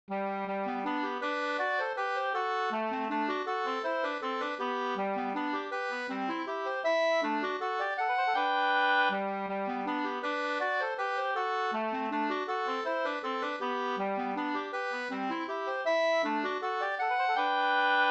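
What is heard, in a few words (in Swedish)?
vals, two-part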